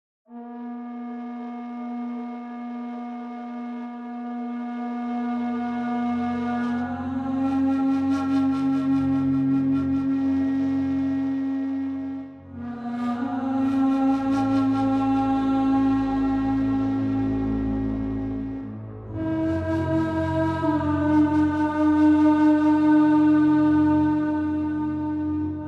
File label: Tent Ambient Music Cue; Level Music; Night Fold